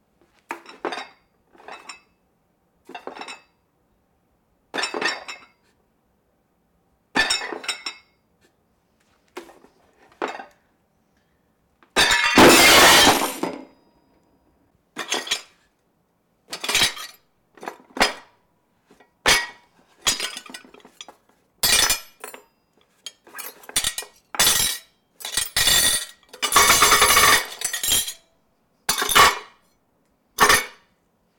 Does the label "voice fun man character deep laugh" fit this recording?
No